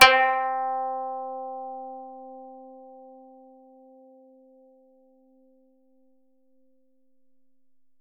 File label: Koto C4